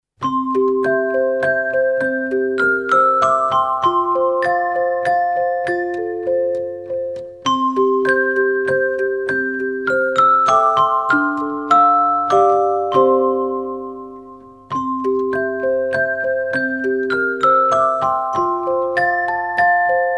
Sweet, ringtones; TP-LINK; Neffos C5

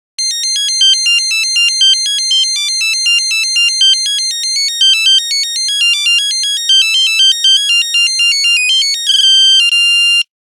Fuga, Nokia Buzzer; Windows Phone apps

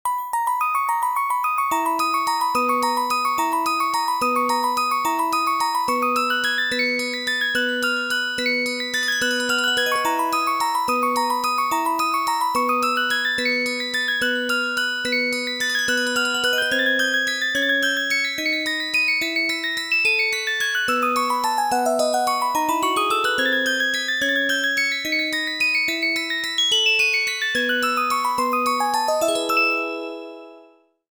Pleasant Minute Waltz, alarms; LG

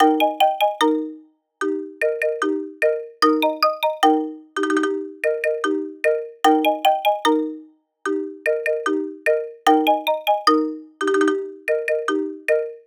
Bell; Ai+; Pulse

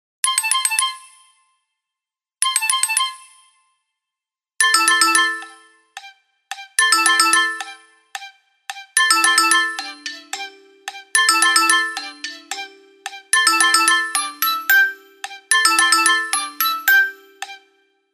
Melody, Ring; Doro Liberto 820 Mini

